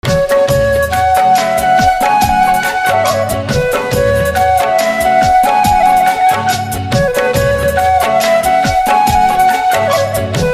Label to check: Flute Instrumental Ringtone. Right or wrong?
Right